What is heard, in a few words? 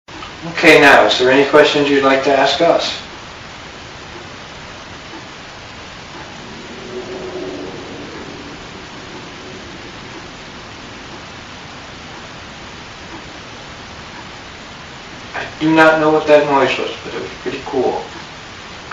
moan; evp audio clips gallery 1 our most active location